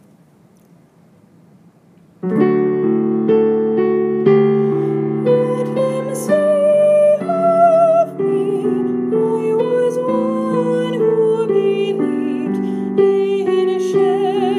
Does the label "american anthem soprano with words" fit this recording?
Yes